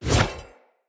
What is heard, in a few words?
Sword Whoosh Heavy; Fey Sword